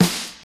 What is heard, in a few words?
airy steel snare drum sample f key, steel snare drum tuned to the F note; snare drum samples